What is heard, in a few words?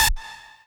lead a